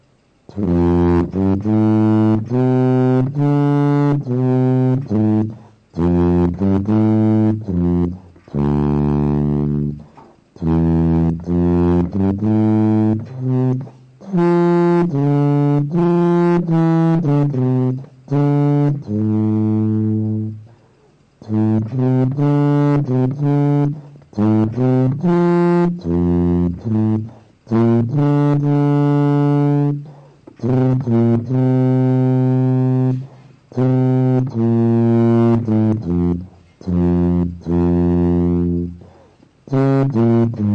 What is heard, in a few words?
tuba; western